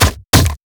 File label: bear basic attack; LoL; annie base